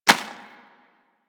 Rodent Hop Step Rear Dirt, Forest Rodent Footsteps; Characters; Foley; Warframe